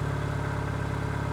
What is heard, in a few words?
reel Spin; netent